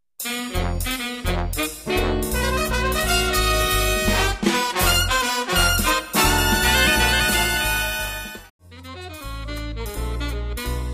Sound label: Blues